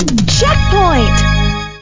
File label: Checkpoint; cruis n exotica